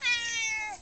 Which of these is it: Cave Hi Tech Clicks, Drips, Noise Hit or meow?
meow